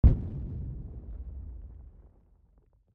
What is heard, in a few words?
AA Shell Blast